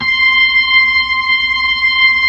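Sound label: B-3, Hammond B-3 organ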